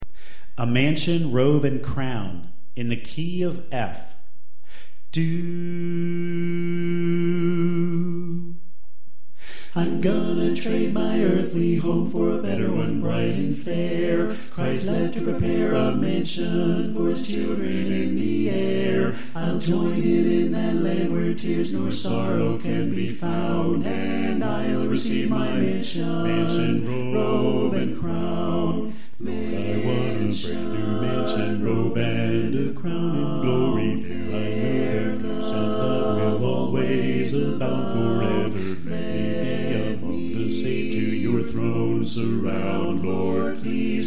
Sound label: Tenor Left